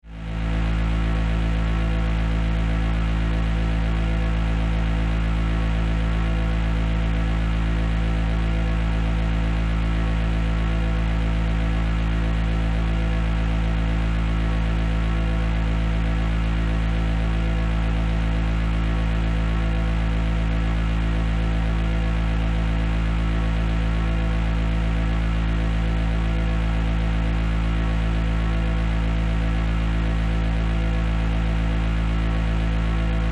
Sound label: Force, Field, Energy, Source, Scifi